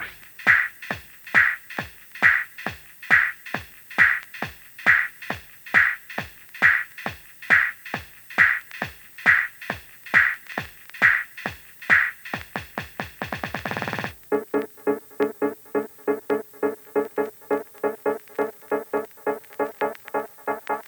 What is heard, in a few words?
Dub Mix